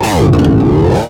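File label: guitar effect, distortion guitar; East West - Global Sounds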